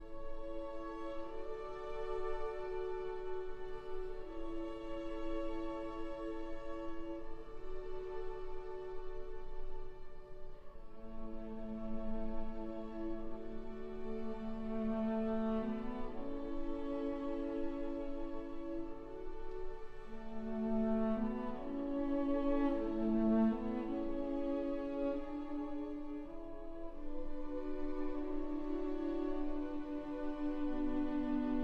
for cello and strings

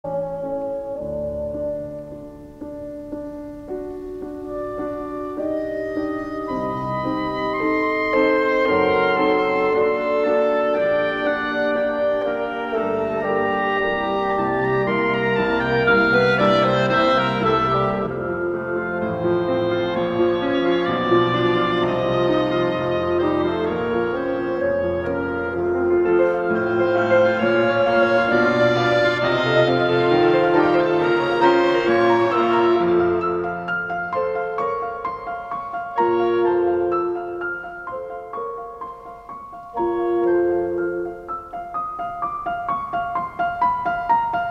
Quintet For Piano and Winds in E flat major; Beethoven, Ludwig Van; Opus 16

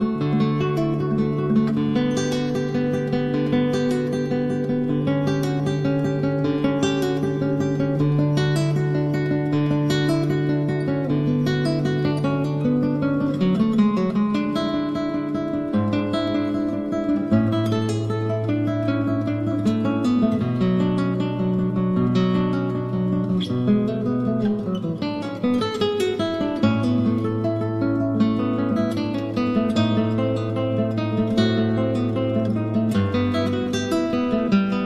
Cello Suite No. 1- Prelude

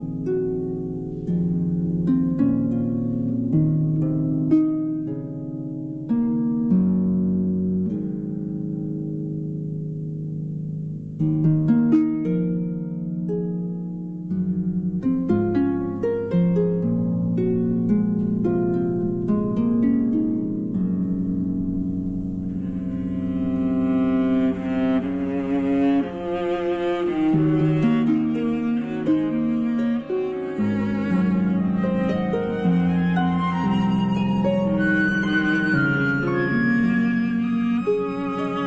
Harp Concerto Lullaby

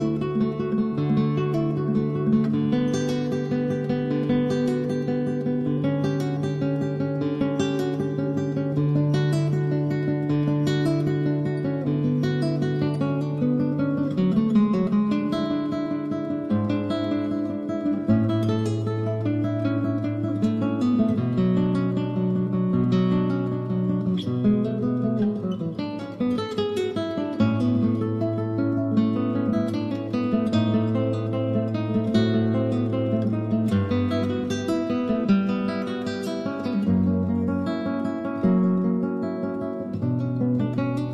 Cello Suite No. 1- Prelude

